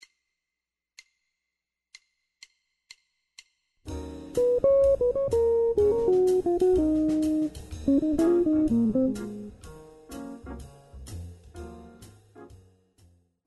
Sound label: jazz guitar improvisation; practice routine